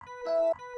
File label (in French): Fermeture de session, Windows 7